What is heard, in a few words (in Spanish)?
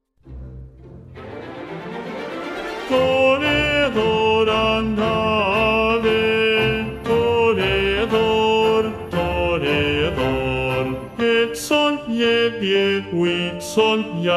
BAJO Toreador Song VOZ; opera